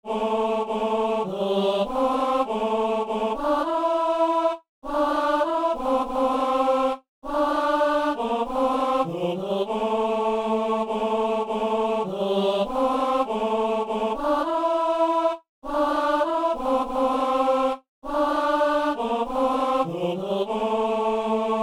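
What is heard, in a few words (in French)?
ténor; al messe au dieu de la paix alleluia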